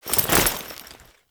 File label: Foley Weapon Swap; Warframe; Kahl